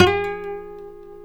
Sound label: A 4 HAMRNYL, HAMMER NYLON, Guitars